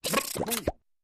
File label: Circus Machine L / R Ch. Cartoony, Robotic.